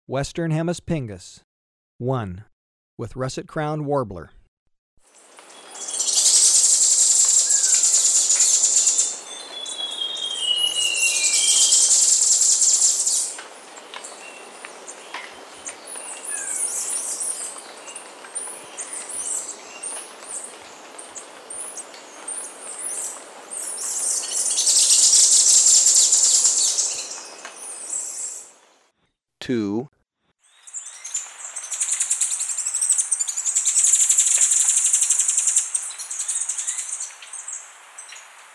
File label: Western Hemispingus; S. America